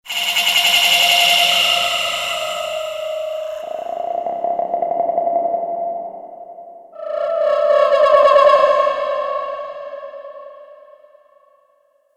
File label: fantasy creature